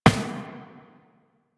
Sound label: Step Glass, Footsteps Walk; DE; Warframe; Tenno